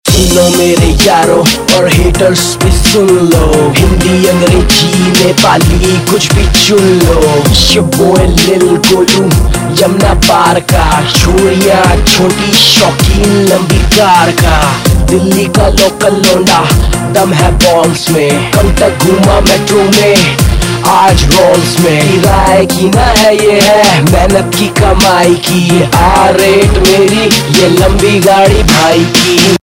RAP Ringtone; Indian Pop

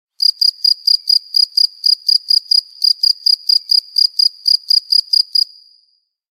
3D chirip cricket

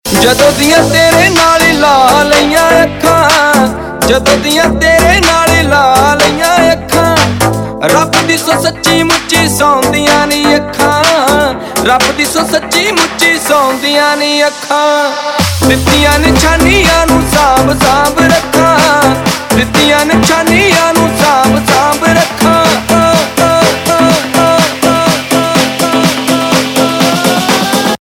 Ringtone, Punjabi

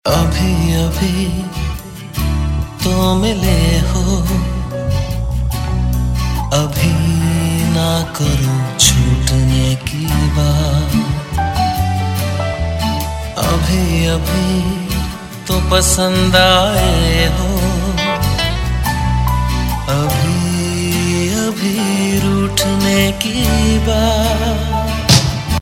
Male; Valentine Day

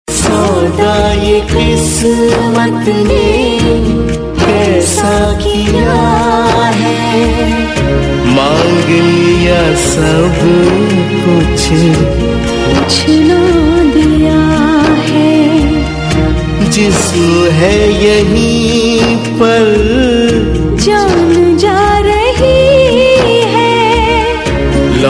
Sad Tune

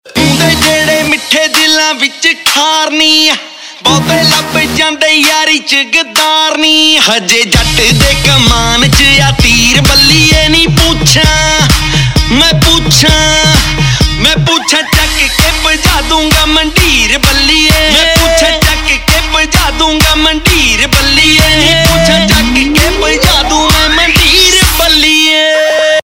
Boliyan Ringtone, Punjabi Pop

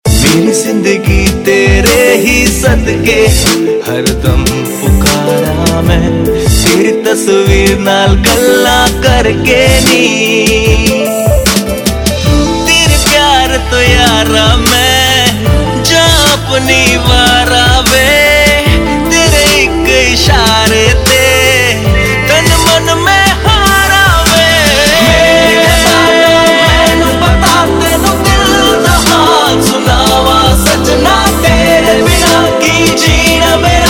Ringtone, Punjabi Pop

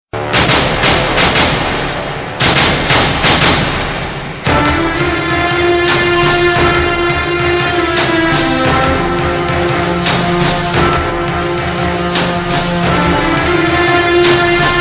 Theme Ringtone

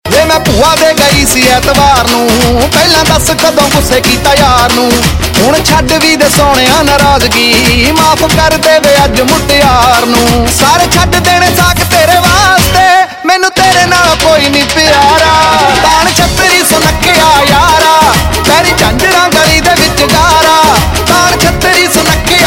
Ringtone, Punjabi

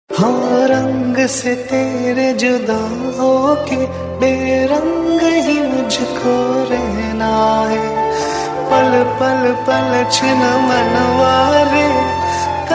Heart Touching Sad Tone

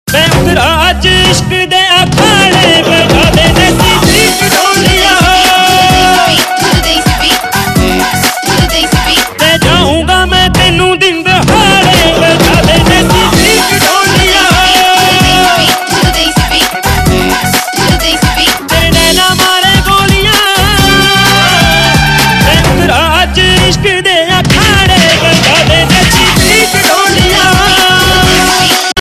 (Punjabi Hip Hop Mix); Bollywood; Bodyguard (2011)

